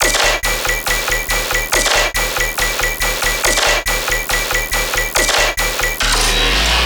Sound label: Bass Loop D# 140BPM, Dubstep; Fairy Bass; Ghosthack - AC2024 - Day 13 - Dubstep Sounds 2025